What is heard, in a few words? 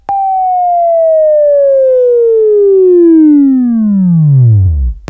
WAV Right, Sweeping; Sweeping Training program